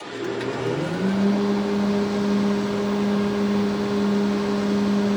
Aircrafts A-10 Cockpit Inverter; DCS

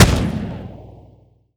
Automatic Tunguska End, Weapons; DCS

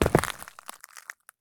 landing glass; Escape from Tarkov

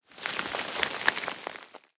bullet dirt debris

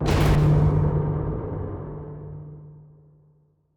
damage hits explosions; World Of Warships

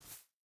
footstep hide grass jump start; Rust

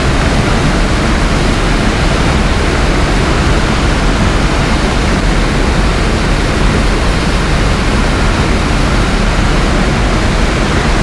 Aircrafts Bf-109K-4 Plane Wind In R; DCS

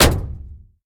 bullet hit inside; War Thunder

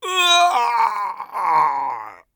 scav death, voice; Escape from Tarkov; scav5

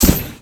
vehicle spacesuit thruster start

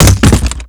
weapon container plastic; Call of Duty Modern Warfare; physics

